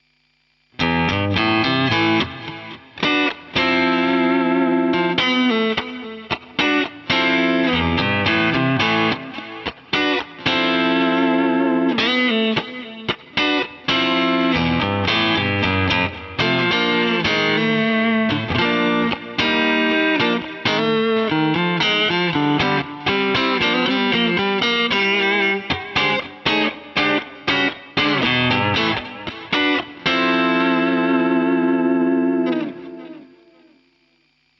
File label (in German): DI Aufnahme Boss CS-3 S-Gear Plug-in